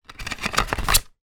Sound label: technology game disc remove from case; nintendo wii